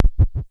KLONE XMOD, Dual Percussion Synthesiser

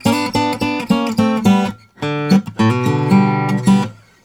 Blues Guitar; ILIO; Fretworks; LICK

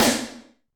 Snr, Alesis D4; Bitchin; 300 Drum Machines

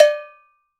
Cowbell Low, Roland BK-5 Percussion

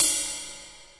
Ride, Boss DR-550, Drum Machines

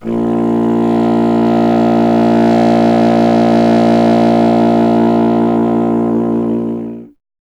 C. Bassoon, solo instruments

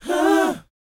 BOYZ, Vocal, R&B Dance

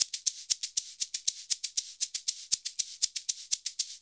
SHK, Shaker; Univers Sons; Basicussions

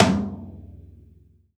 Acoustic kit; Akai MPC2500; Drum-Percussion

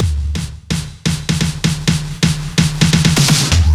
Fills 128BPM, Clubsounds

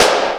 XD5 Snare, Kawai Drums

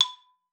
B4, xylophone; Xsample VOL-4

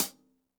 hihat - closed; JazzFunkKit

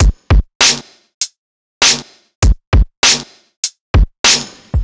Raw Brk HAT; Rawcutz - Nu Forms

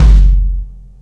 Kick, Kick One-Shots; KJ Sawka - Drum n Bass & Beyond; Drum Tool Box

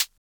closed HH, Univox SR-95

